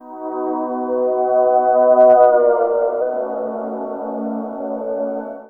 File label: Dred Pad; Jungle; Pads; Blu Mar Ten; Jungle - 1989 to 1999